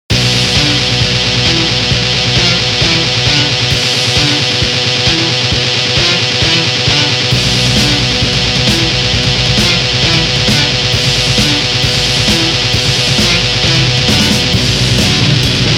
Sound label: ROCK BATTLE